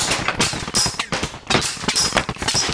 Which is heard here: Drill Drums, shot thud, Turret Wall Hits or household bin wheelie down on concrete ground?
Turret Wall Hits